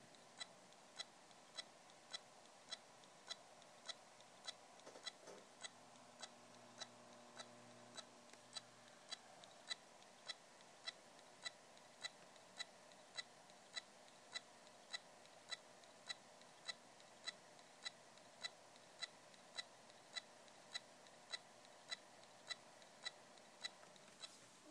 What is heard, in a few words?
poor macbook